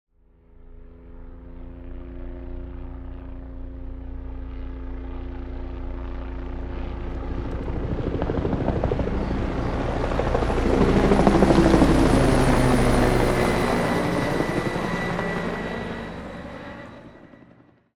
Police helicopter overhead flying overhead and away